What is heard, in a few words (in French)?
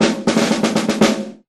Roulement tambour; Cirque